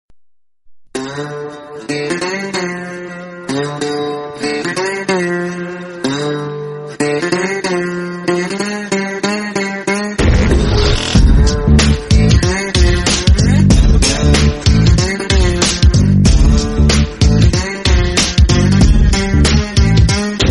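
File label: background music Ringtone; Adhurs; Chari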